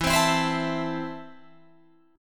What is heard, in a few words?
E Suspended 4th Sharp 5th, Guitar Open F; Esus4#5; strummed; 16,19,16,19,19,16